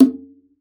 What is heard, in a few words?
Conga, Session Instruments